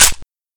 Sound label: bolt release, hkmp5sd, weapons